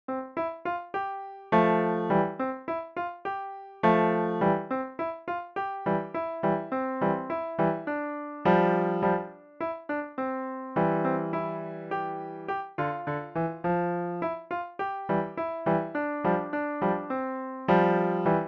piano solo

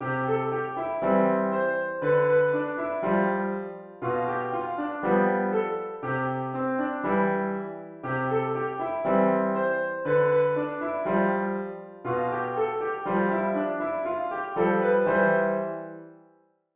piano

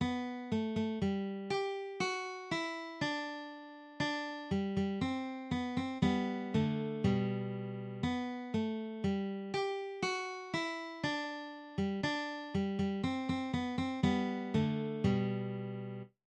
guitar

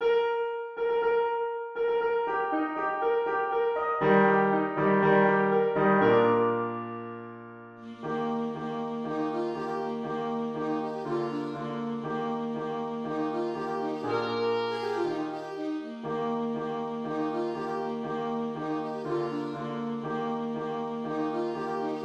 alto sax

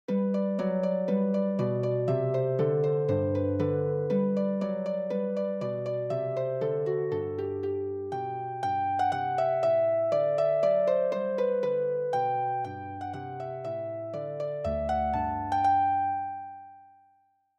russian folksong harp

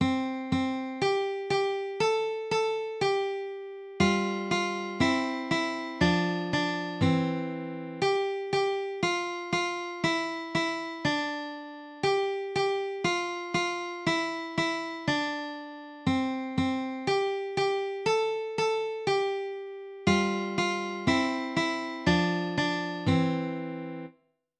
guitar

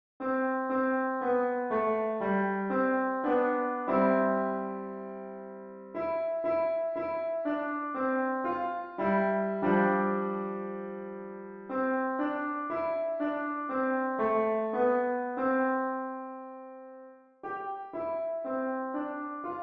piano

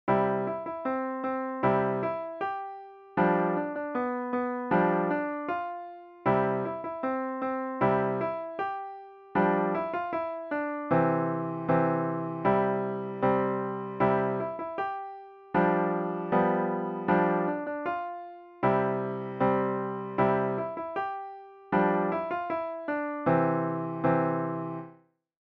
piano solo; skip to my lou

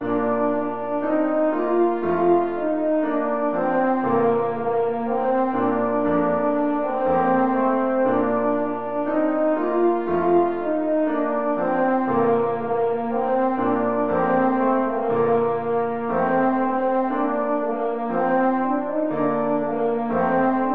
french horn

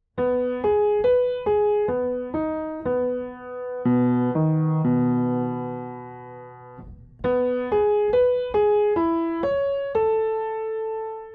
piano

